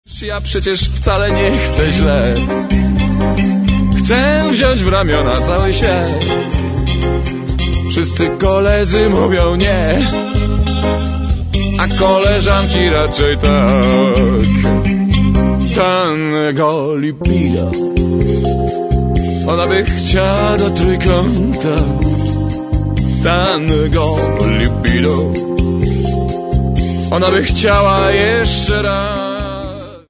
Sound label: tango